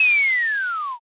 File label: brake